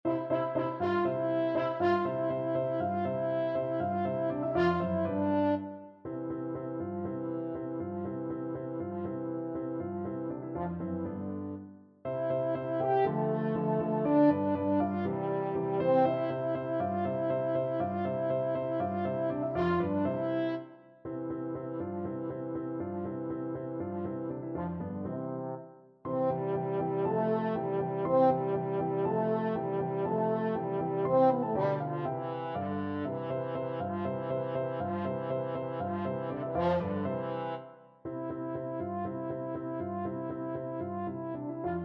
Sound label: autumn, french horn; 1st mvt